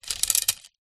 tent pole movement; foley

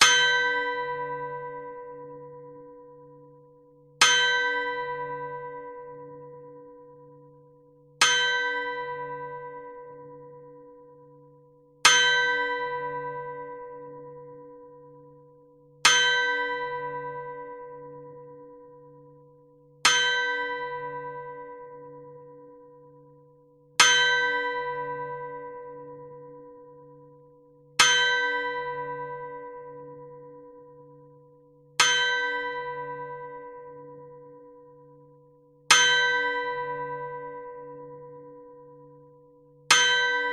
bell clock chime harsh; zapsplat

